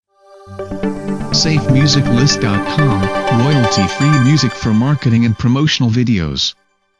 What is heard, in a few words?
Audio Logo